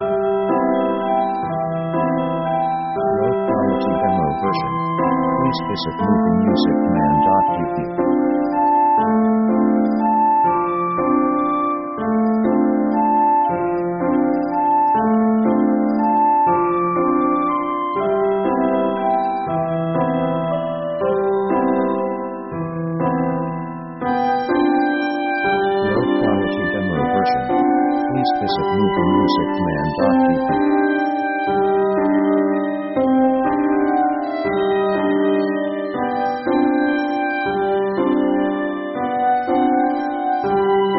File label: piano naive sketch; Basically slow